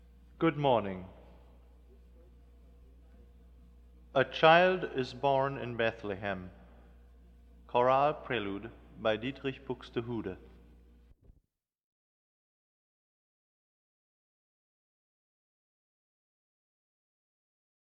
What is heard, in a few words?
Chorale Prelude; Buxtehude; A Child is Born In Bethlehem